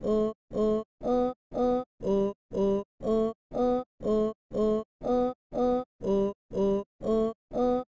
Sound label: woman oh oh